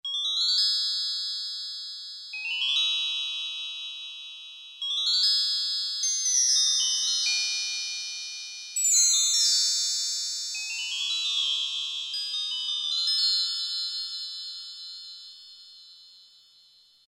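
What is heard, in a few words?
drum wind chime; E-mu Proteus FX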